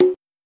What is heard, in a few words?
Bongo